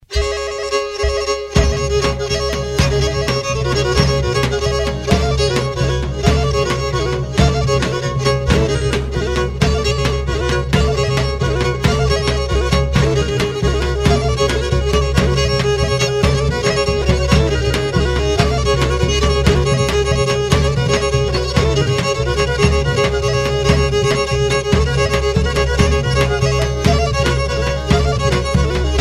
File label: pontos folk